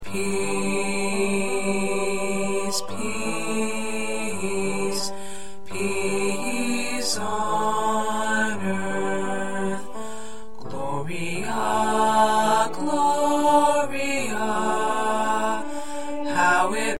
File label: Baritone, choir